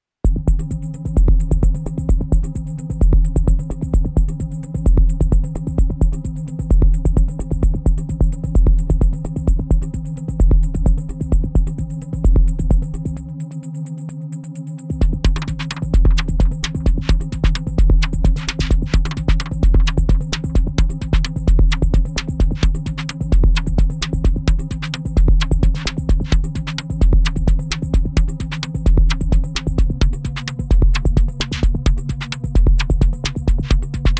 Quiet droney minimal. Two patterns, light tweaking.; Syntakt; FM-based